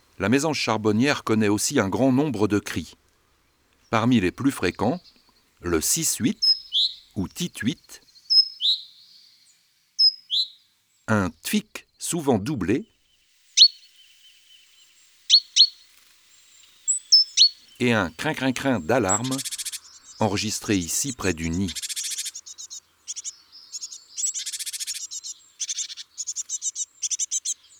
Mesange charboniere, Oiseaux des jardins

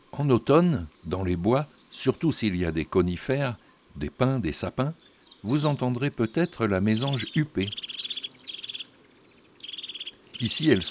La Mesange huppee, chants d oiseaux; Automne

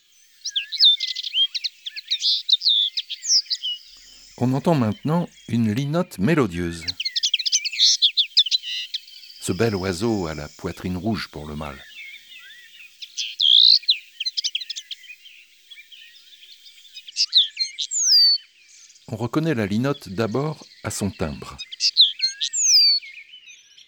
Linotte melodieuse, ABC des chants d'oiseaux

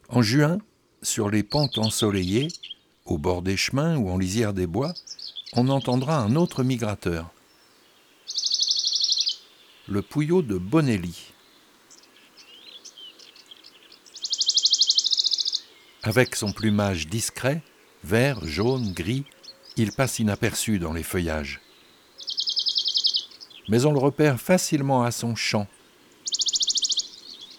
Le Pouillot de bonelli; Les 4 saisons des chants d'oiseaux

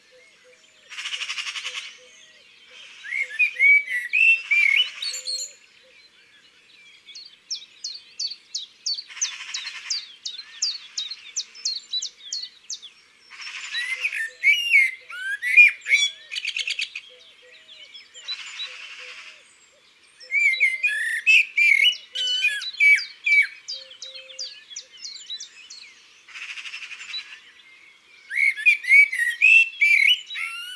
Pie bavarde Chants d'oiseaux dans la campagne

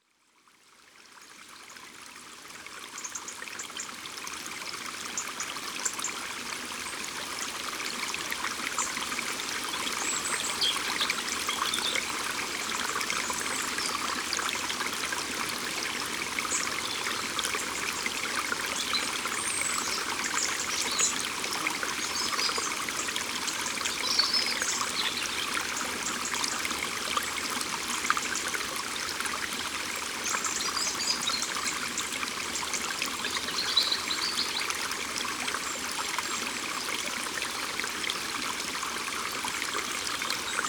Le ruisseau et les rouges gorges Gourdon; Ambiances Sonores d'Ardeche; Studio Les Trois Becs

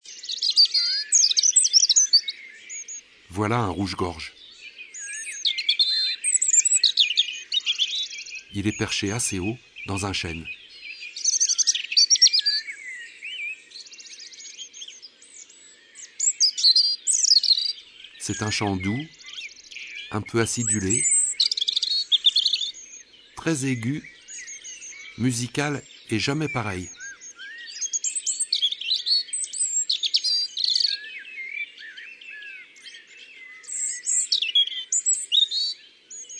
chants d oiseaux le rougegorge; ABC des chants d'oiseaux

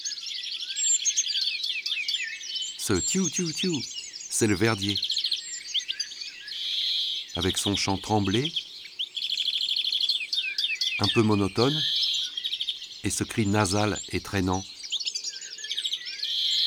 Verdier d'Europe, ABC des chants d'oiseaux

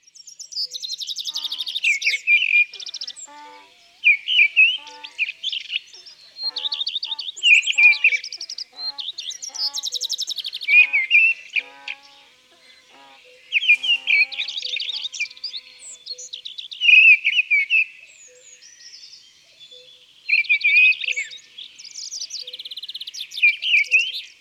Campagne

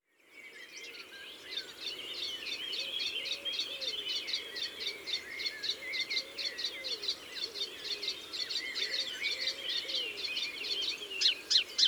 Hypolais polyglotte ABC des chants d'oiseaux

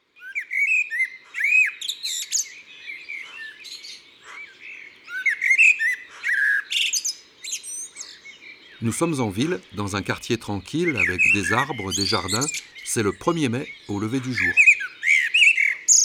Merle noir, ABC des chants d'oiseaux

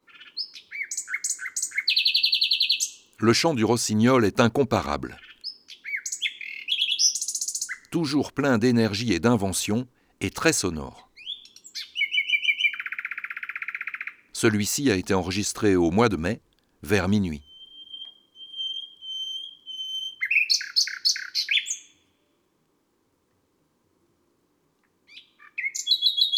Rossignol philomele; oiseaux des jardins

